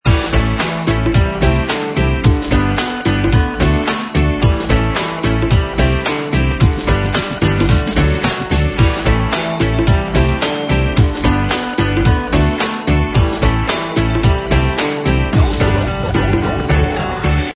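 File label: Funny Children Dance Loop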